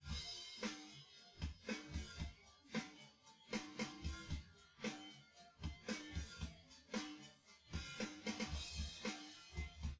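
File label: with drums, synth conv, 250ms, 1m; SiSEC10; underdetermined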